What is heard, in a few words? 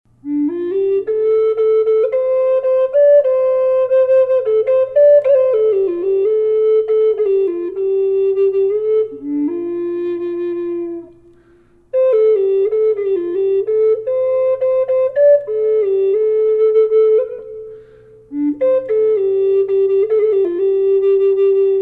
low d reverb wolf, flutes; collector flutes